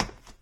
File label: tile step; Original TK Sounds